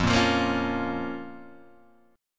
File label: F Suspended 4th Sharp 5th, Fsus4#5 chord; strummed; 1,1,3,3,2,1; JGuitar